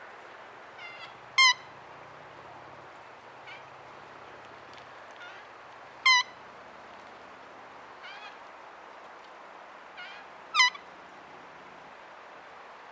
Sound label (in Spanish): taguita, aves